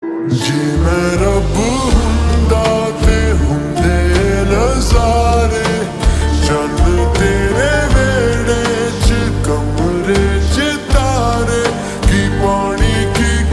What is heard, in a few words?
Ringtone slowed reverb